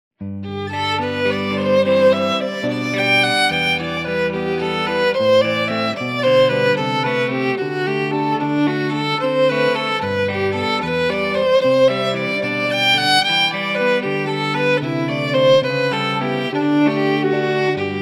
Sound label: jesu joy Guitar; wedding music; Sarasota, FL